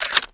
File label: close, foley; tracker